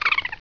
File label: move ball; playforia; Ghosts